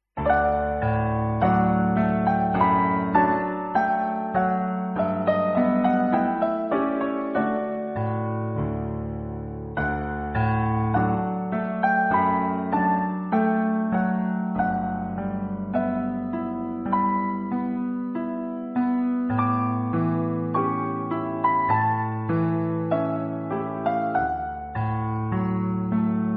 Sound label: stretch